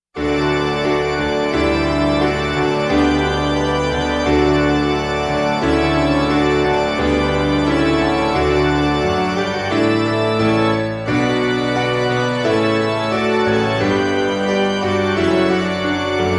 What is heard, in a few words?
Piano Organ; Celebrating Grace